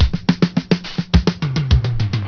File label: red stick fill, drum loops